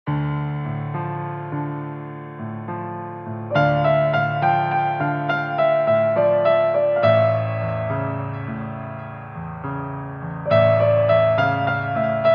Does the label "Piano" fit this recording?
Yes